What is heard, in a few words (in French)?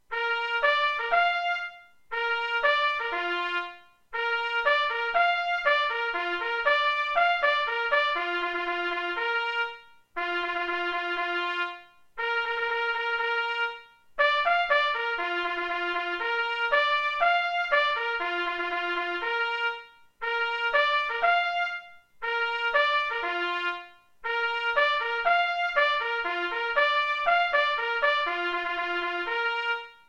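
marche de retraite sonnerie clairon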